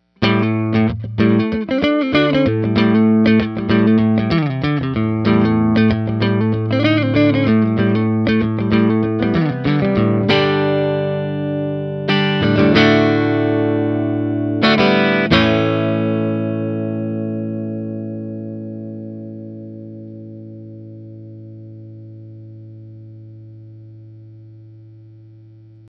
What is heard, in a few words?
6l6 clean